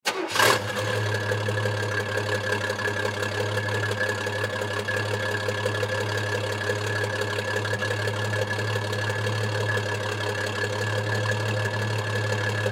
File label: Truck Chevy Half Ton Stepside Pickup Start Up Idle Shut Off; Tail Pipe; Truck Chevy Half Ton Stepside Pickup 1973 Start Up Idle Shut Off Tail Pipe; Transportation Automobile